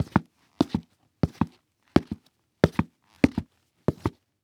Foley Footstep Soft Sole Work Boots Walking On Cement